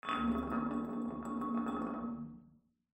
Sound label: glass settle; ambient; amb oneshot